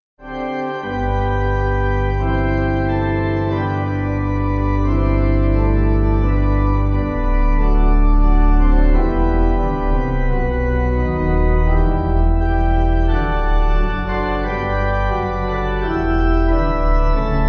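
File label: Pipe Organ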